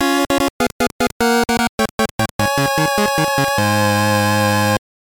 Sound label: Chest Unlocked, A music track; WL3